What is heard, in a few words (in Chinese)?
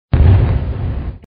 yanhua; 音效